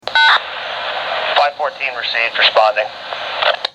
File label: Motorola ATIS, Automatic Transmitter Identification System; ATIS stands for Automatic Transmitter Identification System.